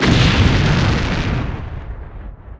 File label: explosion